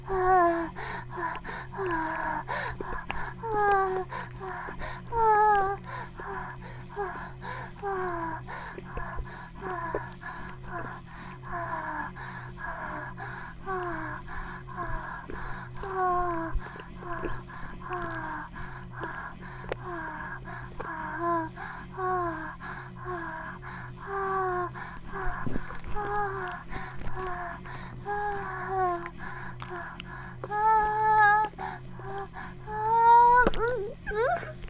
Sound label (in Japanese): バイブ放置, ASMR